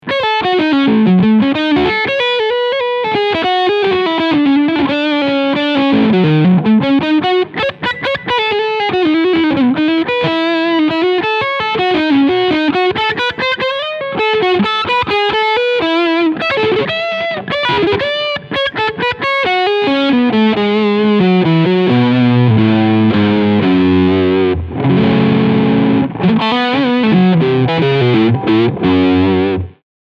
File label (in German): Gretsch G2420T Cleansound Overdrive Steg Pickup